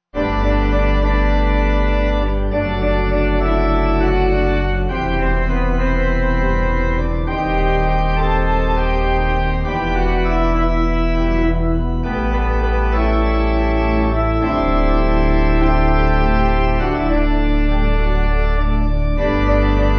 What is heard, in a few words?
Pipe